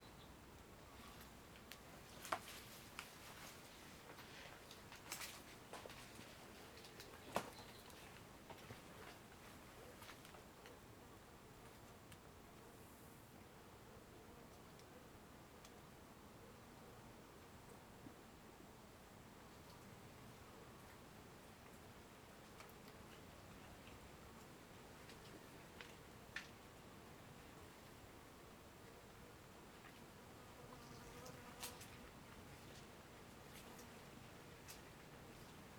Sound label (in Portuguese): Ambiencia quintal com abelhas, estalos e alguns passos na grama; ambiencia rural